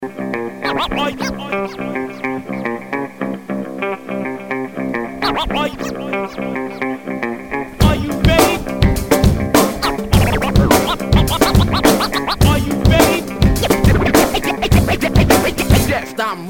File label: Scratch